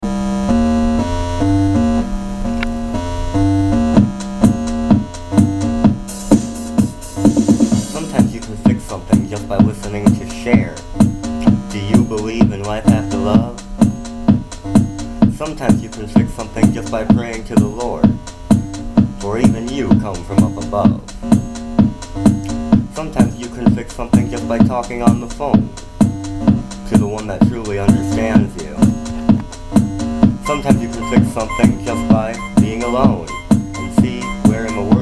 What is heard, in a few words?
Techno Glitch